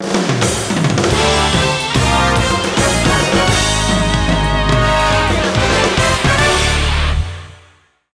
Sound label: fanfares End of game